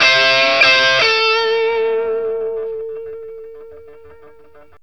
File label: BLUESY A 60, Vintage Blues Guitar; AKAI; USB Soundscan vol.22